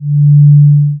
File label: hum